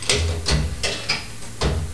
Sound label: coin